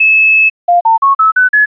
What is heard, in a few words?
frequencies